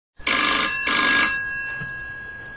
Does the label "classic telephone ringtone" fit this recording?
Yes